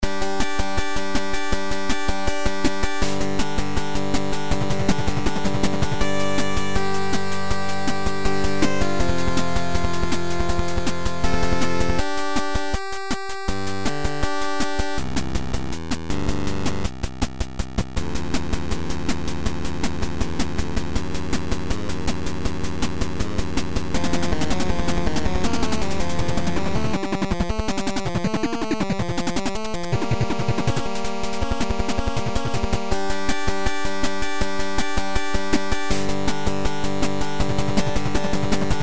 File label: 8bit guitar